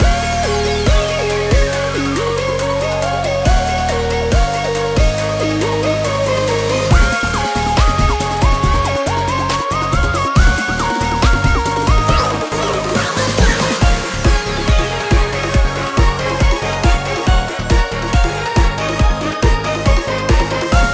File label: BGM ENTRY